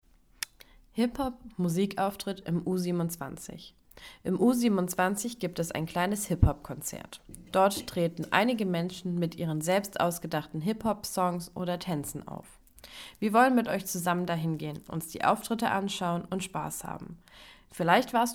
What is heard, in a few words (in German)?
Hip Hop Musik Auftritt